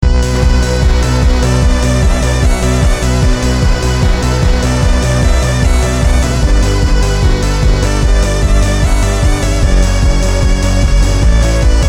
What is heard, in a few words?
ModMix